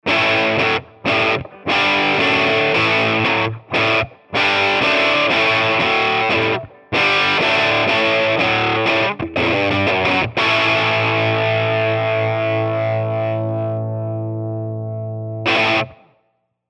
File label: Les Paul rock; Tone Monster